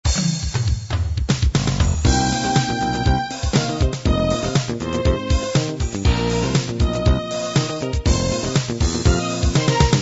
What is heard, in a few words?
disco miami combined